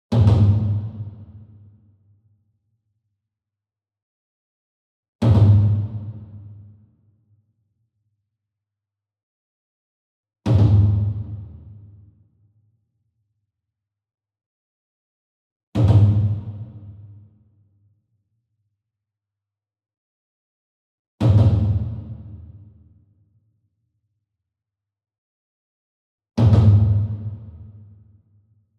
SINGLE DRUM Kodo Double Soft Beater Far, Cinematic Strikes; boom library; Cinematic Strikes Construction Kit